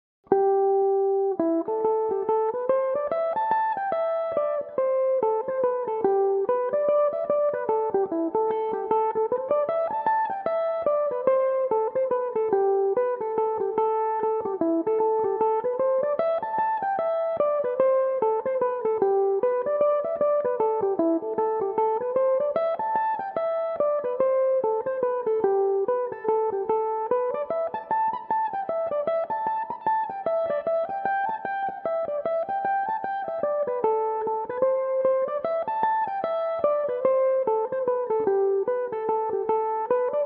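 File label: A dorian, Irish mandolin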